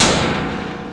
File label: Clank, Industrial; One Shots; Drums; Misc; Sonic Foundry (Sony Creative Software)